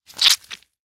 Juicy Burst, Vegetable Massacres; Mechanical Wave

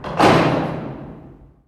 gate stop; hl2; doors; func door rotating

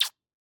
Mouthy, suck, kiss, inhale, quick, chirp, single; Eiravaein Sound

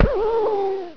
houndeye die; hl2

